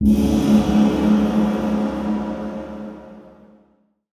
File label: Death Gong; portal quest android; Necromancer